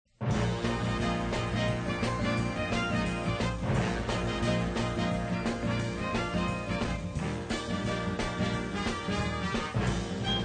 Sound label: Orchestra